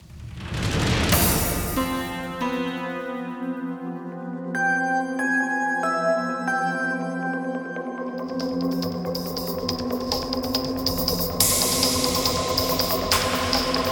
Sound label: Stomp; Tension Action